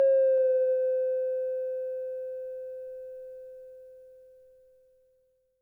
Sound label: chime, Korg Electribe ER-1; Kit 4